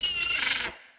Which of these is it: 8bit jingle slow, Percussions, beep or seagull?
seagull